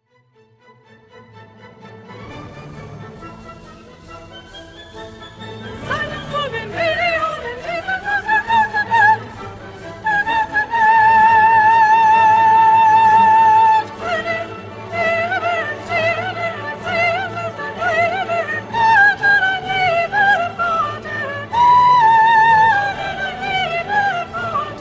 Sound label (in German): Seid umschlungen Slow SOPRANOS